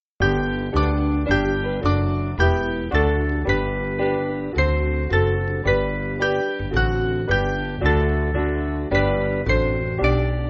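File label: Piano, Music Backing Files for Churches; Clap Your Hands; Ephraim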